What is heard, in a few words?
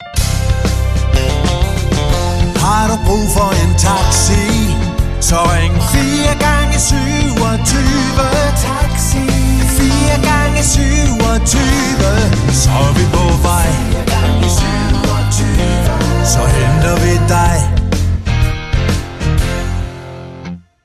ringtone